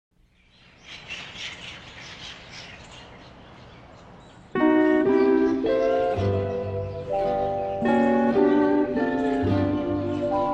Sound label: Instrumental